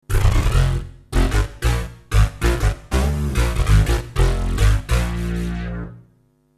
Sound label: Roar Bass